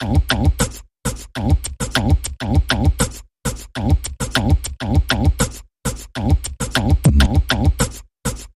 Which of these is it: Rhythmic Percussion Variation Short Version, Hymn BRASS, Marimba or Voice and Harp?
Rhythmic Percussion Variation Short Version